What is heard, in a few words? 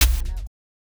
Element Snare